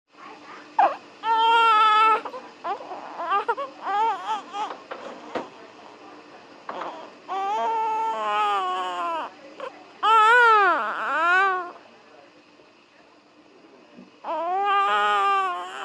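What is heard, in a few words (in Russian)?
ребенок в роддоме слышна атмосфера родовой палаты; Плач новорожденного ребенка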